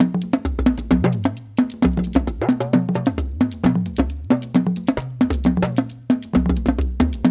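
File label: 132 bpm, Ju-Ju Talking Drum Lead; Spectrasonics; Supreme Beats; African Contemporary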